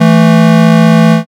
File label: buzzer end